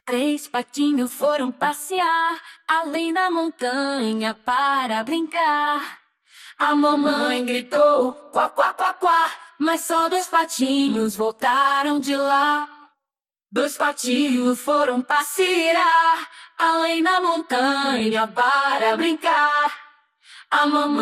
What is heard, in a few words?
Vocals